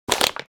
Crushing a plastic bottle before throwing it in the trash